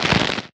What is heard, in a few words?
asteroid damage small; Factorio